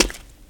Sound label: Foot Step; Legend of Keepers; Foot Step Gob